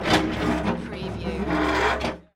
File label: Metal Stress - Scraping Metal Rock